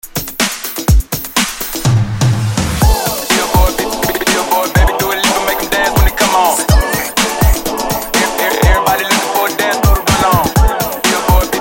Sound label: Wordplay 124 Bpm